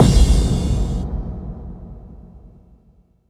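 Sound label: bass drum FX, dance drums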